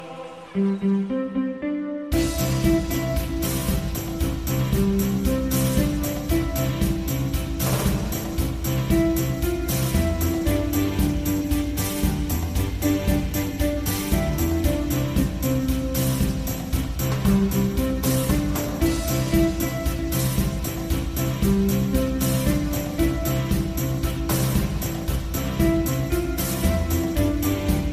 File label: i phone 4s 70s classic ringtone